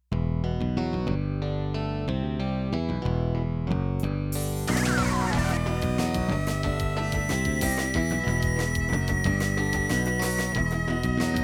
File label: SY85 Pre; raw